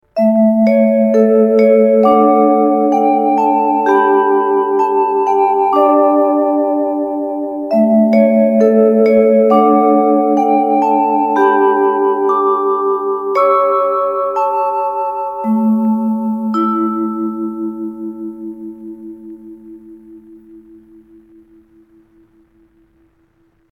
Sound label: mk ii vibes with vibrato